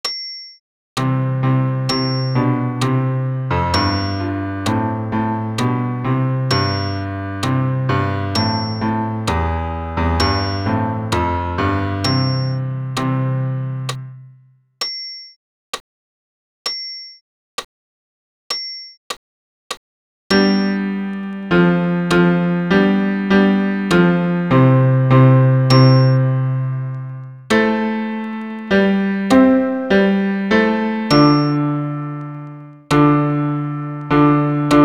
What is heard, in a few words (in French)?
Credo bas pian acc